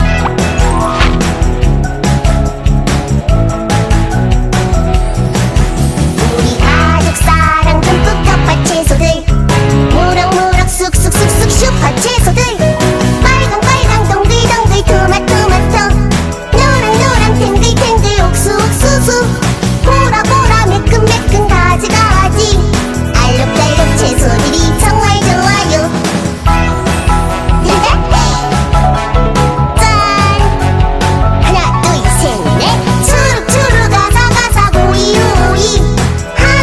채소송; 슈퍼잭

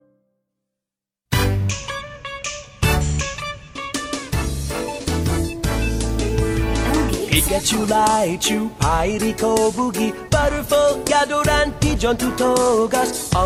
영어남 엔딩 노래; 남자; 포켓몬스터

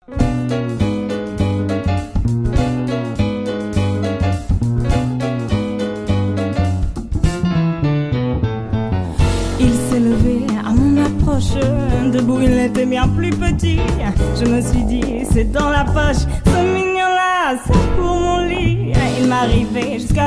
영어 여 재즈

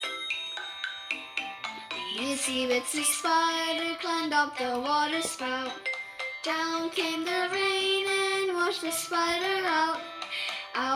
영어남, 아동 남아, 노래, itsy bitsy spider; 남자

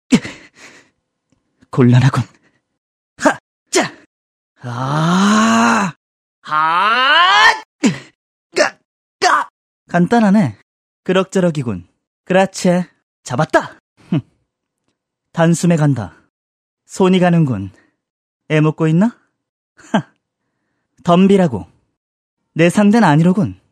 미소년 캐릭터 호흡 모음, 남자; voice

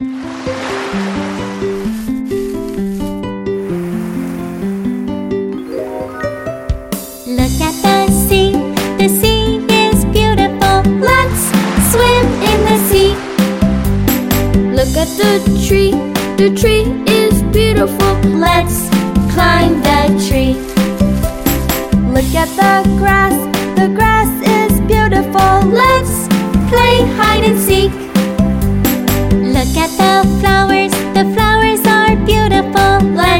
영어남, 아동, 노래

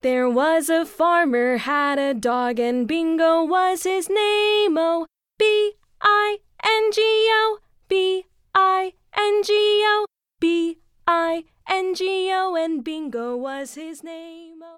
영어여 동요

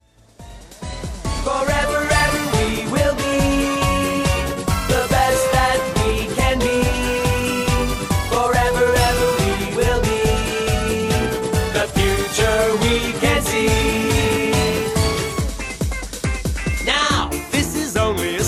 영어남, 오프닝송; 남자

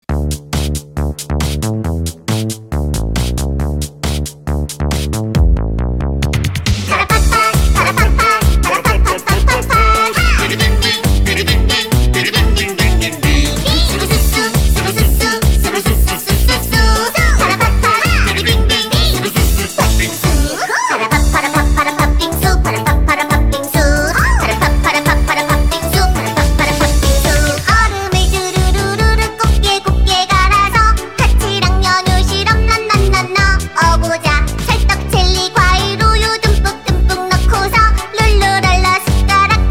동요 귀엽고 통통 튀는 여아

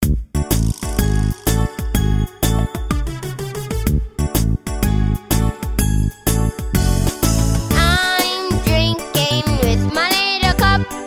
영어 여 노래

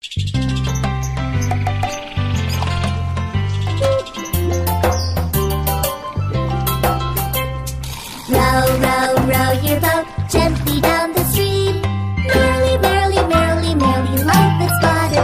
영어 여 Nursery Rhymes; Pororo

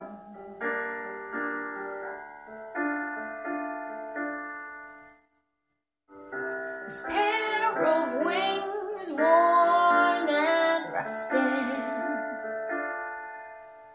영어여 노래

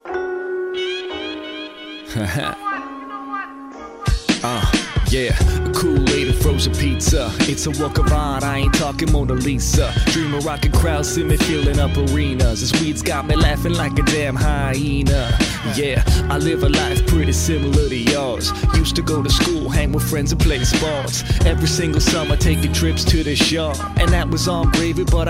영어남 랩 Rap

